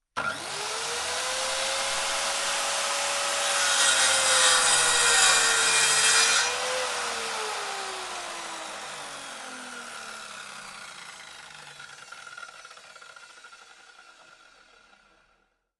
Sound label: carpenter tool small circular saw sawing; fvtt sonniss gdc audio